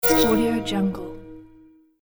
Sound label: new notice